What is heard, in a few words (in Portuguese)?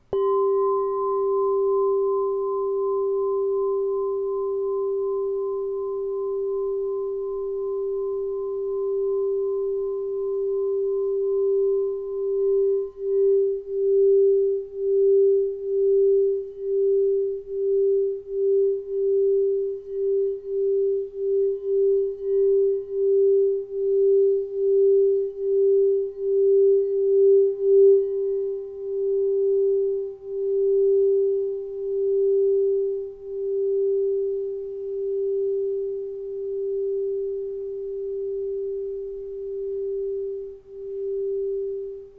TACA G4, instrumentos de quartzo; escutar instrumentos de quartzo